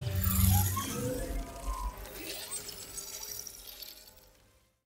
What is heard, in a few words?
input sound; Iron Man